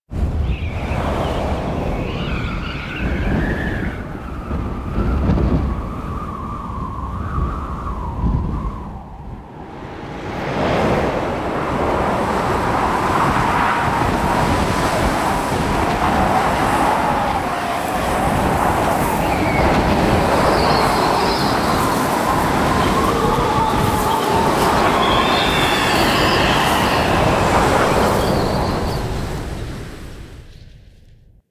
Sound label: Mountain Pass Intro Wind; Cinematics; Warframe; The War Within